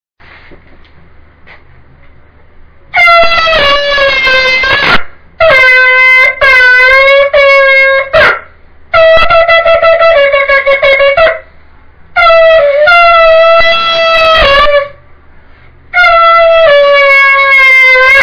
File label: shofar